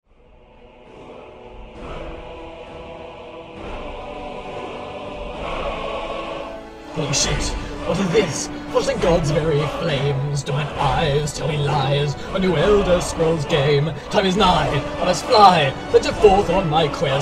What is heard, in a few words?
now with music